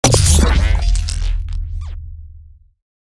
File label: hit complex short